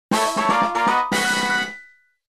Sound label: Get Treasure Box; The Wind Waker